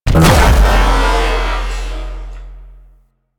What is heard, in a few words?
Baby Dragon Death Explosion; Enemies; Warframe; Duviri; Void Dragon